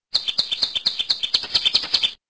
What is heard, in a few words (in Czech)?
ptak